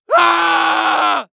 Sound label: Horror Scary Human Male Scream